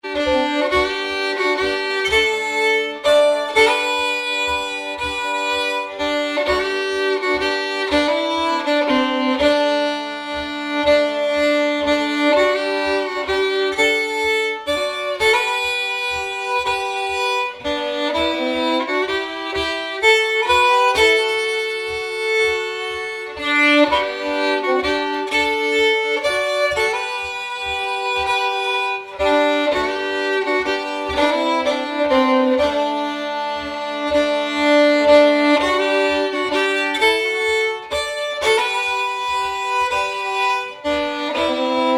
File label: Waltz